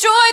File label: vocal